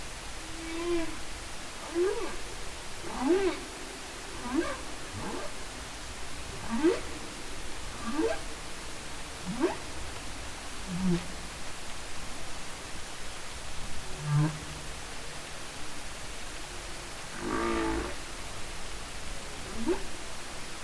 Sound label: Humpback whale song